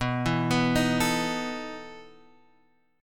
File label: E Major 7th Suspended 4th, B